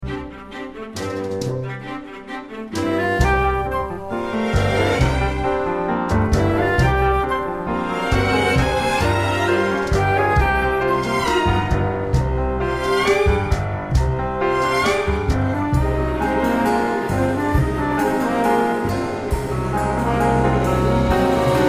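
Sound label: accompaniment only; audition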